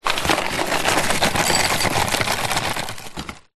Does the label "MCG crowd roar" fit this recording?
No